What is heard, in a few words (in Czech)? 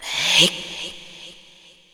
Vzdech